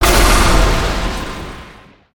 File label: Lightning Strike; Shinobi